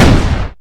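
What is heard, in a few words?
grenade launcher fire, weapons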